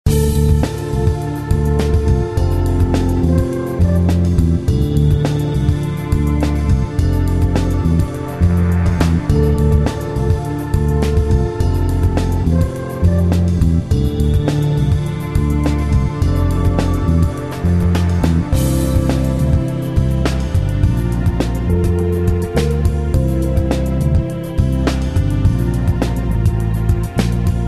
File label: Game Music; scattered-bolts